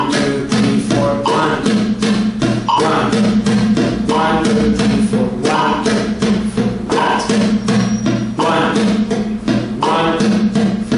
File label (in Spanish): Rasgueo, guitar